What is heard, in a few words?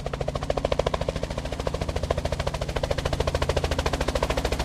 heli